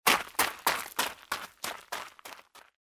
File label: goat Run Gravel